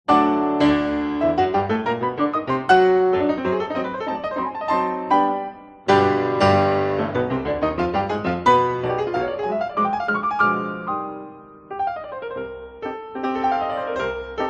piano test

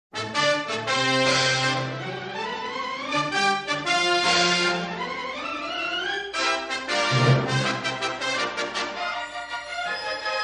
INSTRUMENTAL; NEW YORK

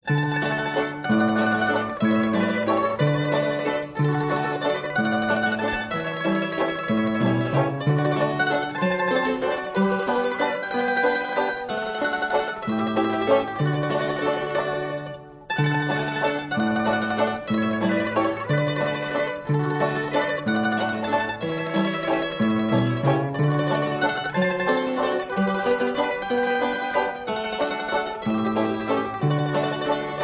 HURDY GURDY

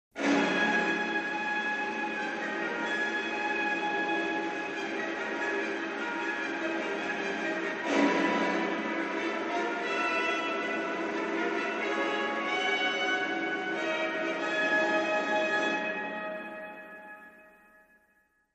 ARENA FANFARE

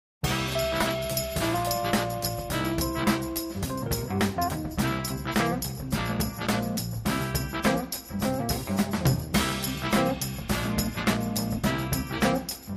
SWAMPY FUNKY

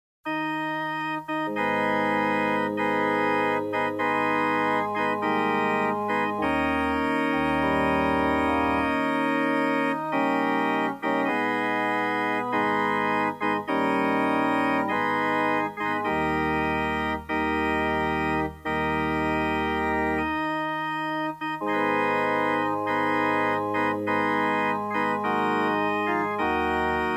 organ piece

